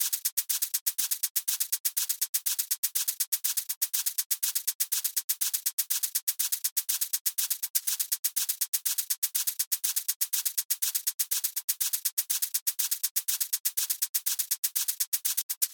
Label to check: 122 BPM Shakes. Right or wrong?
Right